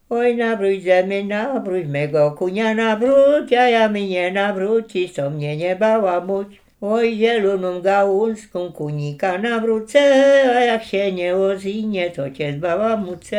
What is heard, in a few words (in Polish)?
przyspiewki koń